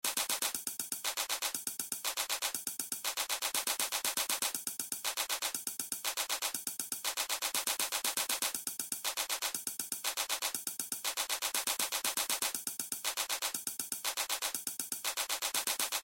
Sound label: beat